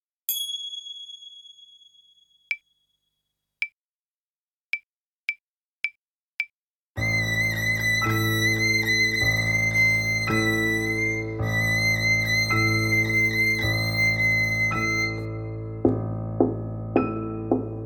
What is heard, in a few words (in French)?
Violon